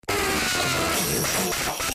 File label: ooooooooughaahaa